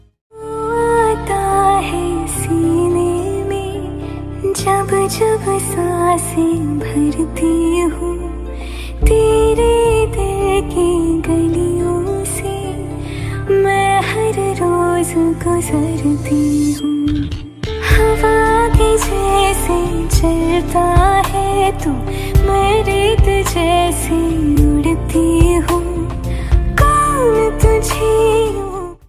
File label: Bollywood Ringtone